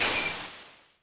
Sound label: machine paint spray end